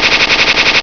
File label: SHOTS; Gold Medal Software - Volume 5; music; war noise